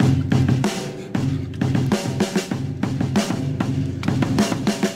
Drum loops, Boombap

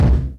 Kicks Loud

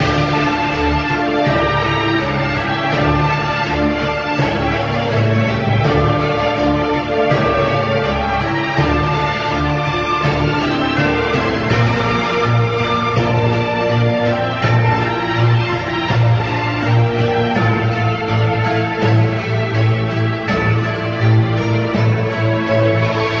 strings impending doom, Sad Loops

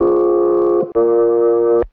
two note organ; Loops; Electronic

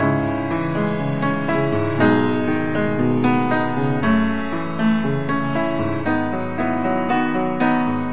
piano, Sad

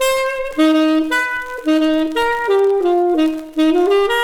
sax jazzed up, Loops; Phonky

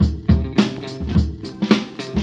slight guitar, drum loops funky

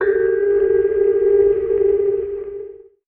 Piano

